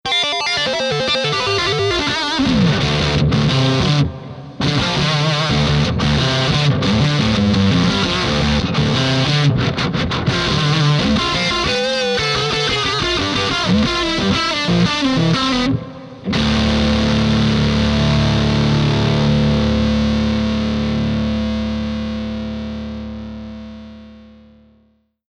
Electro Harmonix Cathedral Accu Spring Line6 JTV89 Vox Satchurator EHX Ring Thing Roland JC120